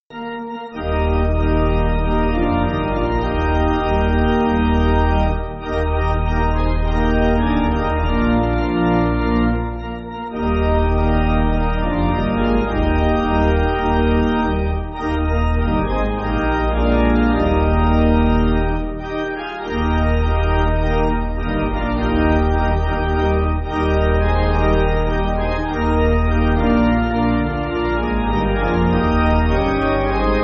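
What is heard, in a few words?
Pipe, Pipe Organ, Music Backing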